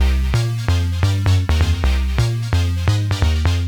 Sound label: Bass Loop